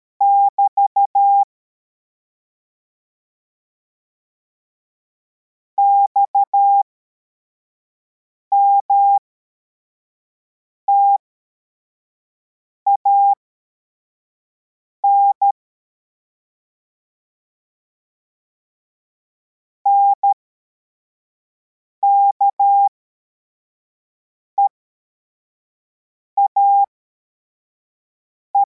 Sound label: cw, morse